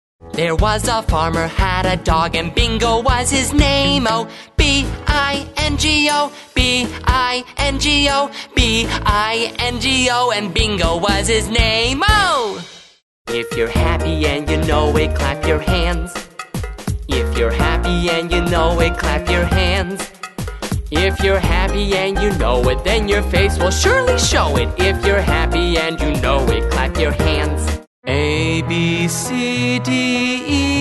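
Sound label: American, songs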